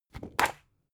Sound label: spring binder close hard